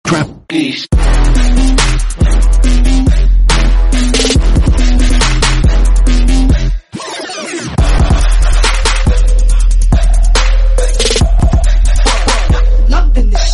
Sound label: long tone; iPhone